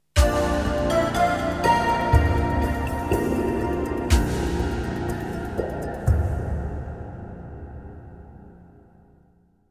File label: jingles; 90 DDD Jingles